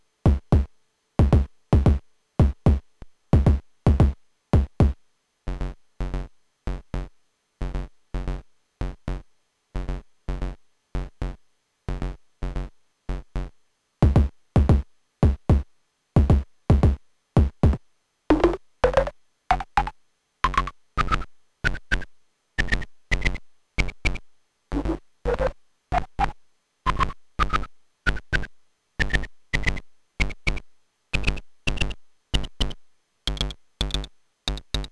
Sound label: Twisted Electrons deton8 Kick Reverse